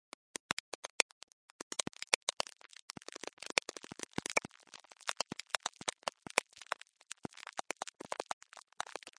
cookie crunch; REMIXABLE COLLECTIONS; Kraddy Collection

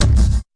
fx drum, Electro FX

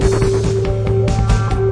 140 bpm wah 2 the future, drum loops and breaks

